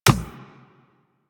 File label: Beach Ball Floof Kick